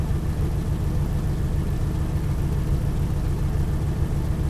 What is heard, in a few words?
wildcat cockpit, engines; War Thunder